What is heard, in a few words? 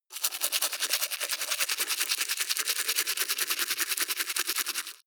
teeth brush dry open circulate fast